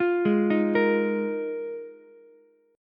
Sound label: F Minor 11th, Ukulele C6; Fm11; arpeggiated; 10,8,11,13; JGuitar